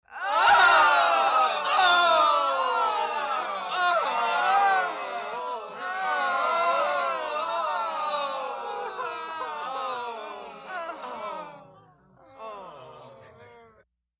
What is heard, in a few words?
Grp Moaning Weary Lou